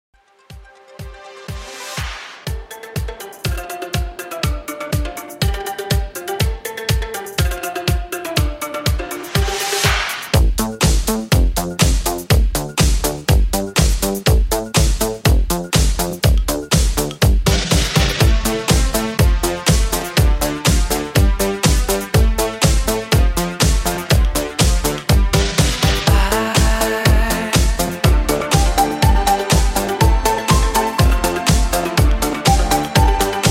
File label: 80s Classic Redrum 122Bpm; djyan